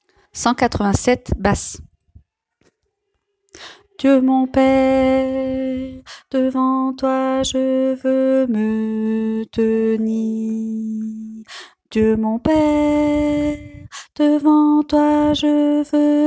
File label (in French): basse